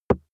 plastic cream bottle hit

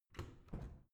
washing machine modern open soft

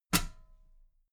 metal ladder step soft

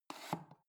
foot switch dimmer slide up

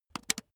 razor attachment retract hard

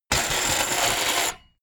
bmx bike grind metal

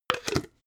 jar glass plastic close cap

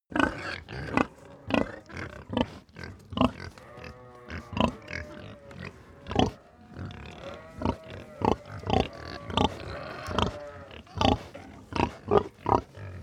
pig grunt crowd calm

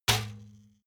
trash basket metal hit

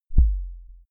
sub kick clean

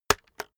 keyboard external enter hit slow

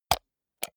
computer mouse wheel click slow hard

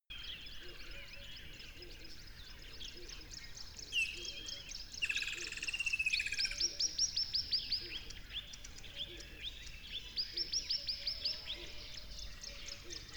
lively tropical river crickets

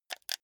console controller click combination

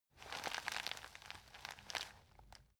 plastic bag handle short

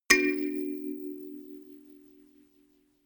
notification kalimba rattle triad minor low

